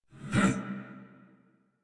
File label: Attack Effort; Warframe; Dax Knight; en